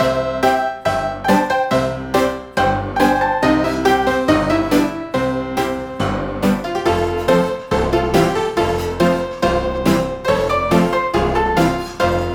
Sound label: Home Ragtime